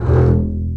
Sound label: Spicato Basses & Celli